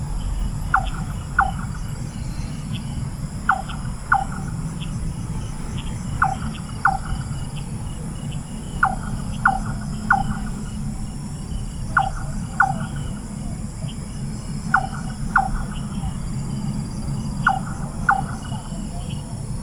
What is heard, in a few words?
large-tailed nightjar; KUCHING